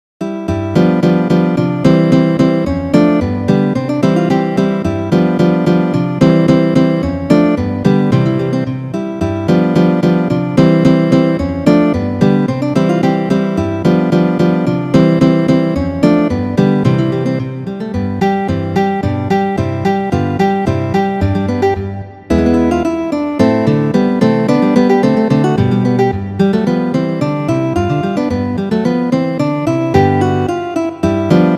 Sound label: duos; kuffner joseph